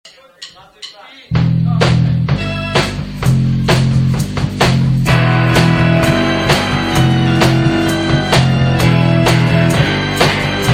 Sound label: g strings